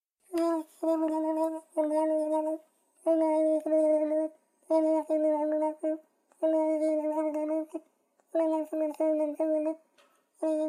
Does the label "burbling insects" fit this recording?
Yes